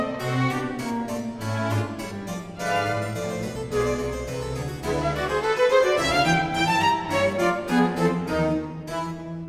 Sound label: Orchestra